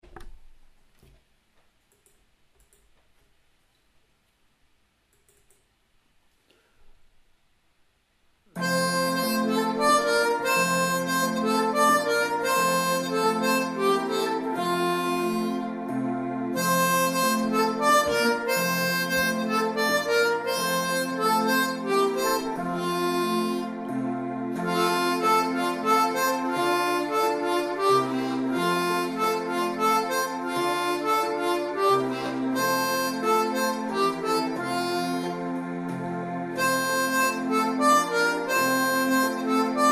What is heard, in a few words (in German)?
Kommet, ihr Hirten F, Mundharmonika